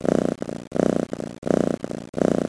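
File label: Cat Purr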